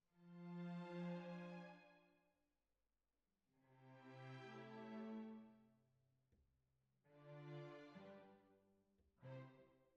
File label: Cbasses